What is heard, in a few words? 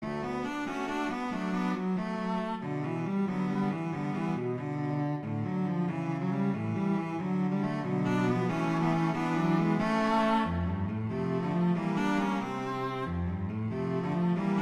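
Etude, cello; Popper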